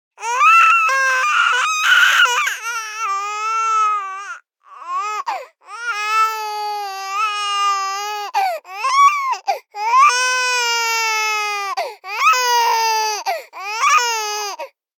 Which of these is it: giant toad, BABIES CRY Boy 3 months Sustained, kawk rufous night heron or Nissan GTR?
BABIES CRY Boy 3 months Sustained